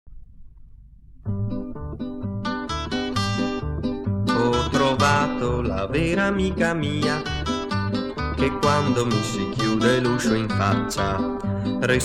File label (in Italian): la mia chitarra